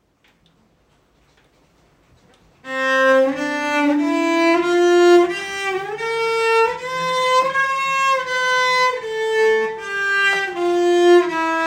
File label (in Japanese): 悪い例のスケール肩上げ, cello